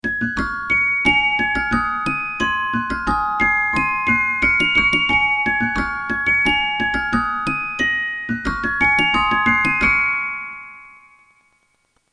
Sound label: magic flute, boîte à musique; Boîte à musique suisse; Charles Reuge; montre à musique Reuge; Glockenspiel